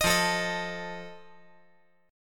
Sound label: F 7th F down